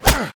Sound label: hurt